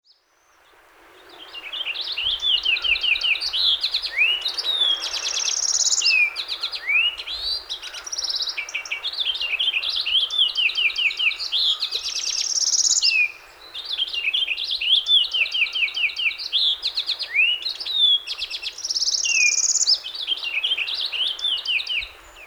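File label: Purple Finch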